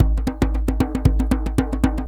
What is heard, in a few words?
DJEMBE GROOV; Best Service; World Colours